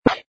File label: shoot